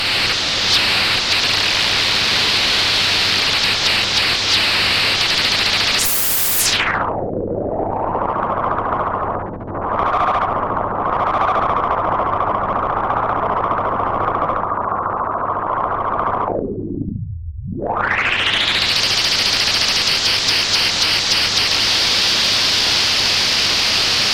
FabFilter Volcano 3

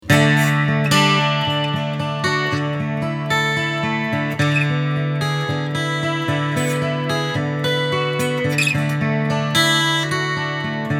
Lava ME2 Reverb Chorus

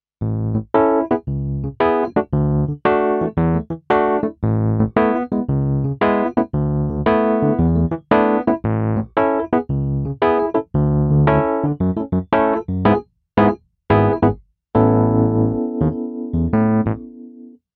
Grand Piano XXL E Piano

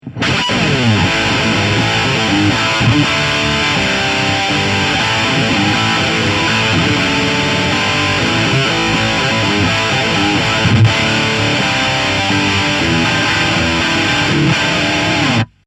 Pyramid Stainless Guitar

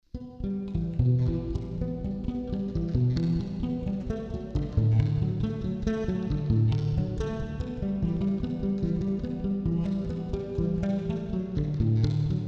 Bass Cave Studio; Charvel

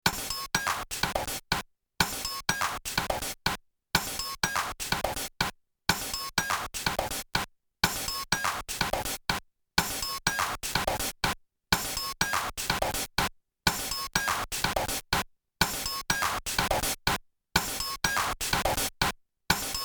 Roland TR 626 Bitcrusher

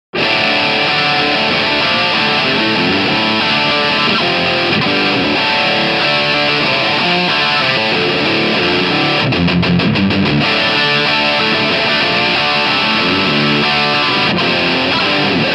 Bridge DroppedD

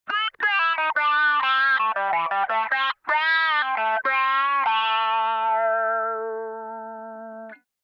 Talkbox

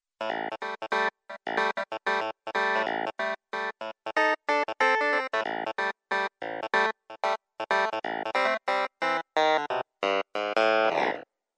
nord piano2 clavinet

